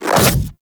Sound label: Infested Splitter Melee Swipe Small; Warframe; Grey Strain